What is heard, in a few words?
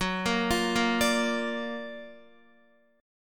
Gb Augmented Major 7th, Guitar; arpeggiated; 4F,4A,5C,5G,5C,6E